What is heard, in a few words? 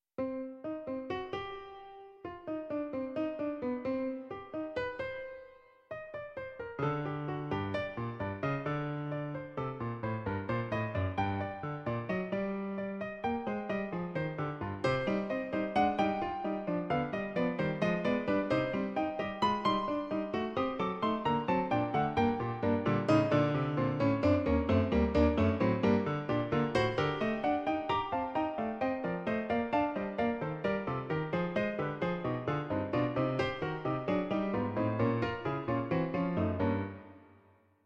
fugue